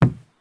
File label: sprint wood; Escape from Tarkov